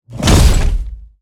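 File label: long mecha, enemy sound impact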